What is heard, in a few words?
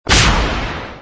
missile Hit; Voltron